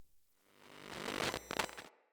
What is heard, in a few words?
Decoy Cardinal Static; Objects; Thawtide